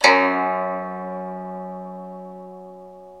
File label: SU GZHENG, Earth Tones